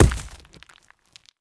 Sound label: golem hit; propack